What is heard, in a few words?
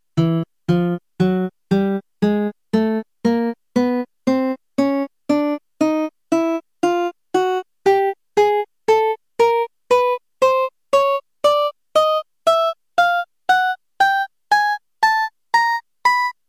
Guitar Notes